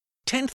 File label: seagull; katamari damacy; heard but not seen